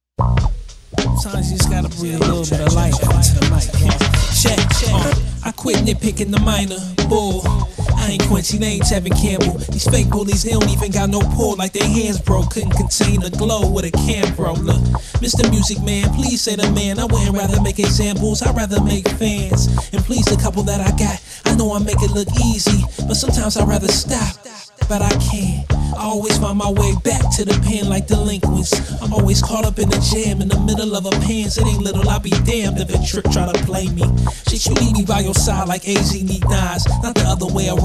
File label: FUNK; BURNTBUTTRDSOUL